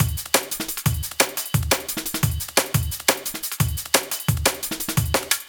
175 D&B Drums; Drum n Bass; Pro Tools Essential Loops; Digidesign; Dance DJ

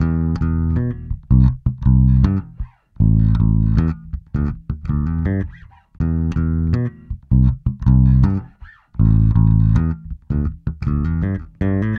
080 Pop E-Bass Emin; Pop Rock; Pro Tools Essential Loops; Digidesign

